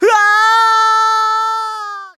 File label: falling; Super Smash Bros. Melee; mars